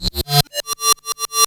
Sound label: NOISE FX